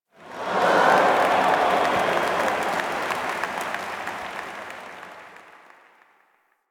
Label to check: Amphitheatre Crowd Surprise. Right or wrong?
Right